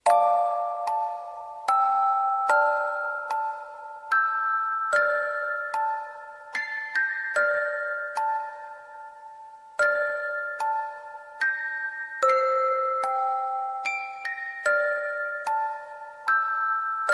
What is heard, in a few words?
Music Box; Davy Jones